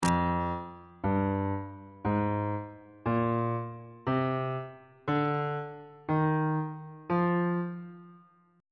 e sharp dorian mode on bass clef

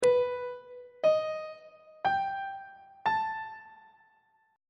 a double sharp augmented 7th chord intervals; treble clef

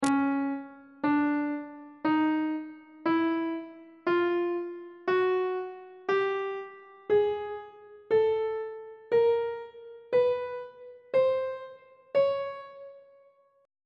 c sharp chromatic scale on treble clef

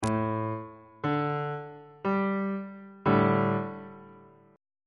d suspended 4th 2nd inversion on bass clef

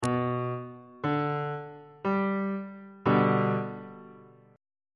a double flat major 1st inversion; bass clef

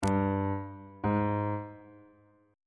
g 1st intervals

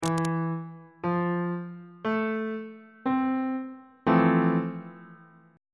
e sharp major 7th 3rd inversion on bass clef

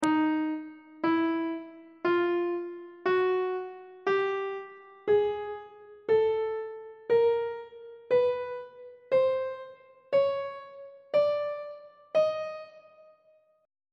d sharp lydian mode chromatic scale 1 octave; on treble clef

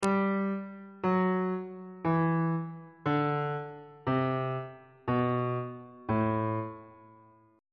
a dorian mode descending on bass clef

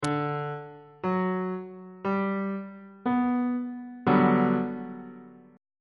g major 7th 2nd inversion on bass clef

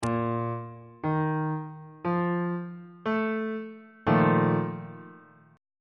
b flat major 7th suspended 4th chord on bass clef

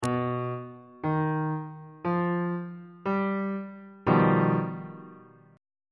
f double sharp augmented 7th 1st inversion

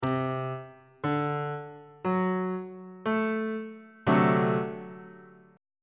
e double flat dominant 7th 3rd inversion

